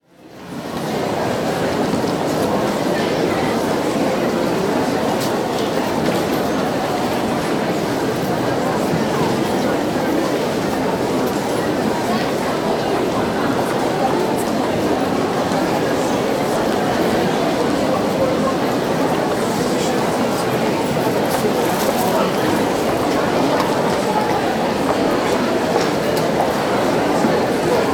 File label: Crowd Indoor Large Bazaar, Walla; Sound Ideas; Walla Series